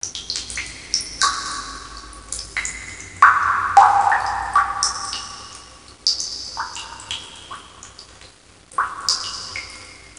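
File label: SFX ambience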